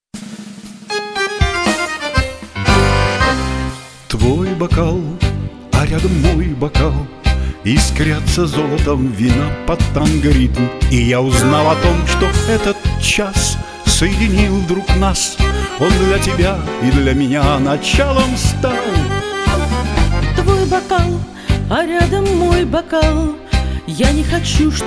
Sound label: Duet